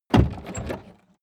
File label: pickup door open